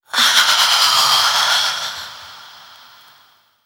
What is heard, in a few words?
"ah" orgasm, Voice; ooh ahh